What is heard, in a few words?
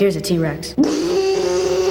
trex kid